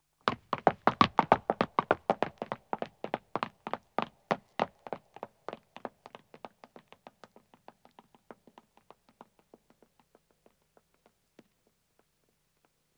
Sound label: Stone Running, 2 Men Depart, footsteps; BBC Sound Effects Library